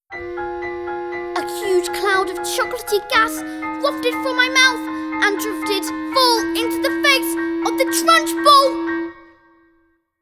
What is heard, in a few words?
Burp Sequence